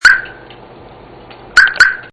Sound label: chirp